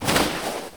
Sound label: Baseball Slide Grass